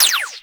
Fire Shot, Weapons; Burston Prime; Warframe

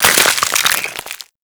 Ice Freeze Hit; Warframe

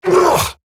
Pain Eximus Grineer Male; Battle Barks

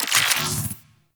Infuse Magnetic UI; Warframe; Warframes; Alchemist

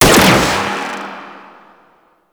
Shotgun Mech; CorpusShotgun

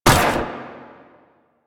Metal Ladder Hit; Nineteen Ninety Nine

